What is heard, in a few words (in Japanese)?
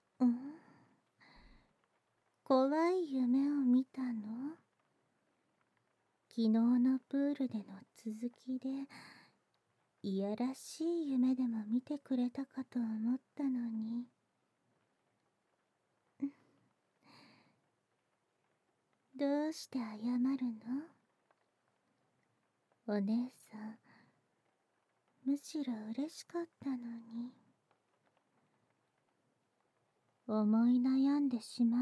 ぬるぬる海草風呂で密着マッサージ